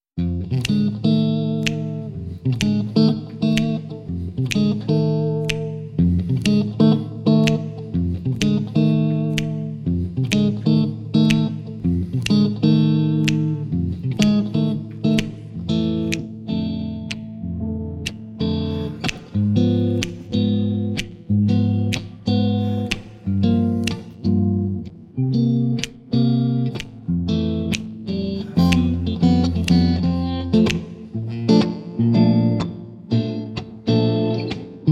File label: (Guitar Voice) (Bonus Track)